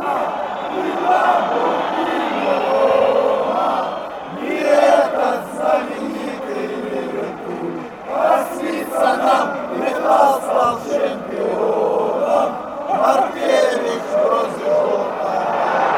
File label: chant; metalist